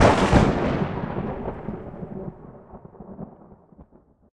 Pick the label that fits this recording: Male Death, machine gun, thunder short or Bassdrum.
thunder short